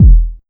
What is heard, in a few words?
127 DRM, Fresh Disco House